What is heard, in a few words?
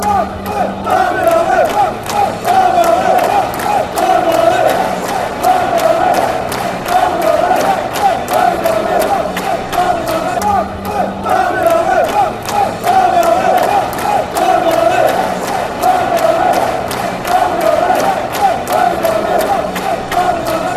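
bradford barmy army, football chants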